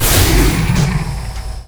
tank servo; Quake 4 Alpha; strogg tank

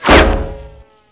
metal imp, ambience; Quake 4 Alpha; id software

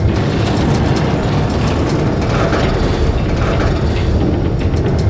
machine grinding; Quake 4 Alpha; id software

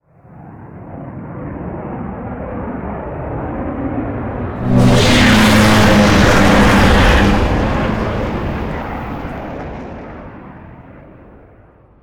strogg flyer by, vehicles; mono; Quake 4 Alpha

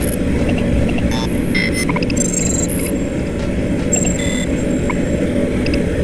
computer console, ambience computers; Quake 4 Alpha

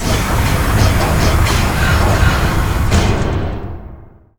arm swing, machines; Quake 4 Alpha

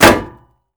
Bullet hit metal; Doom

